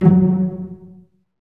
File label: STR SEC, STRINGS; Roland - LA Composer vol. 1